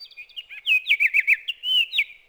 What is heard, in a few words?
oriole